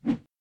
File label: sword